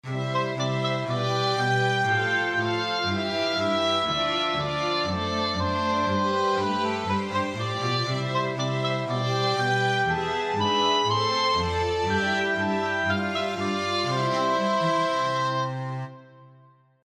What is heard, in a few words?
Oboe; ArtGuide